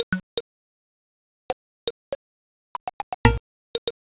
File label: organ; ゆめ2っき